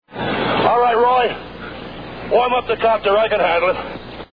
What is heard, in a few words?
copter